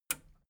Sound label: metal button radio release enable